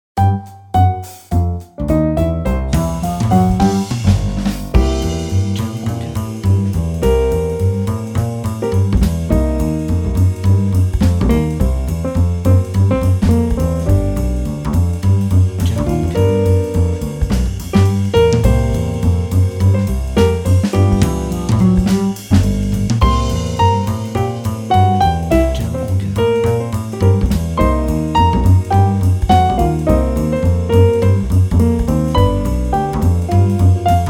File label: Positive Swing Jazz